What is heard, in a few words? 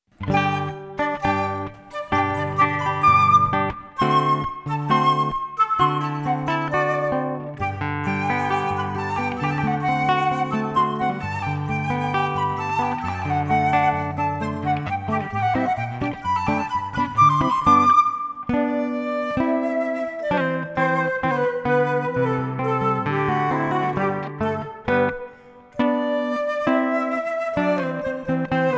Flute Guitar